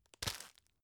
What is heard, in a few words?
tissue grab